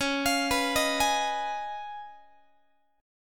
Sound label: C sharp 9th C sharp down Guitar, chord; C#9; arpeggiated; JGuitar